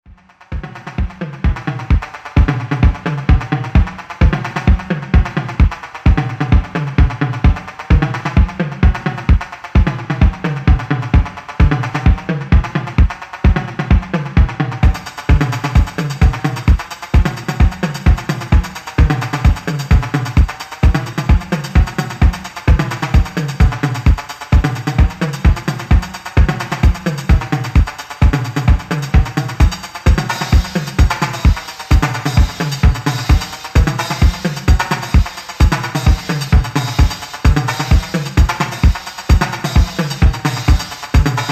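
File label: Just Drums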